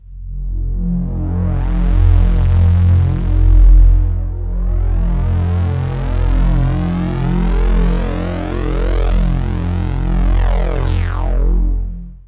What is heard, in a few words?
FX SWEEP